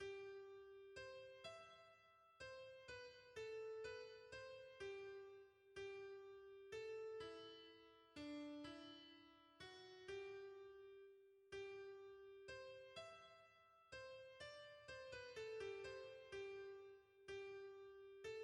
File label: soprano; Au ciel comme sur la terre; hymns